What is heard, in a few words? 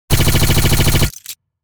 Sci-fi auto rifle short burst